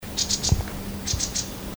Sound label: Green backed Tit, birdcall; Parus monticolus; song, call; Bird Call Recordings